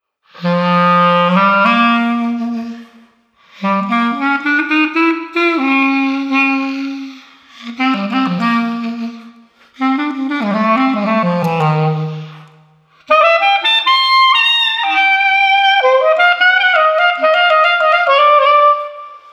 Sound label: Street Clarinet Free Wheeling 108